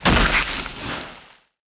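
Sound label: footsteps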